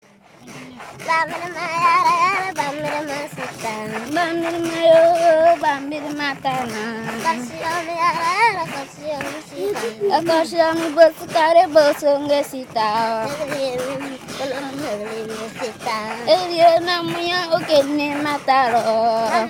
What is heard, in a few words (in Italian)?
canto alle macine gan bourkina faso